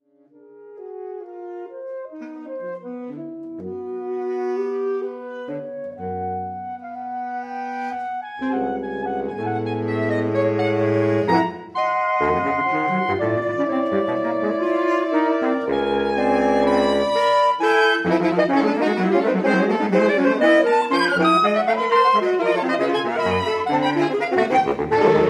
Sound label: Quartet